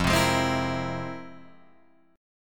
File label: F Minor Major 11th, Guitar, Drop D